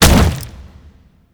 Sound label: Charging Rush Cast; Warframe; Infested Lich; Hard Drive